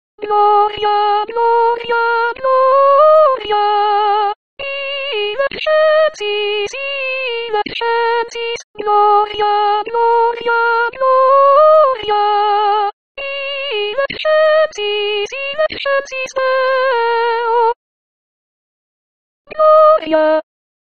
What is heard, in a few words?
Gloria Soprano; Missa pro pace